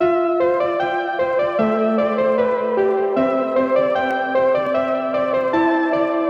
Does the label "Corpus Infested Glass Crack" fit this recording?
No